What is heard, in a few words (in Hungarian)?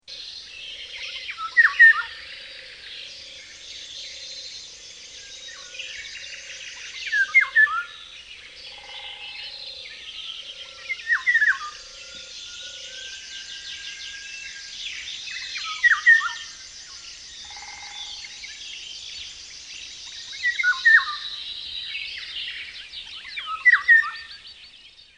Sбrgarigу; Madarak